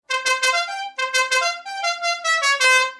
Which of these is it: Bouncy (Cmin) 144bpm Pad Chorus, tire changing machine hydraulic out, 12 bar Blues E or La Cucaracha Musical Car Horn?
La Cucaracha Musical Car Horn